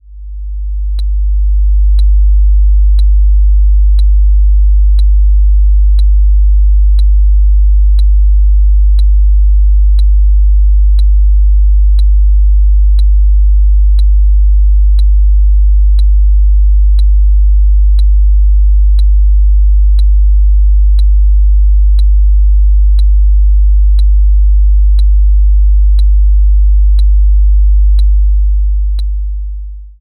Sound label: 50Hz Stereo + ticks; Audacity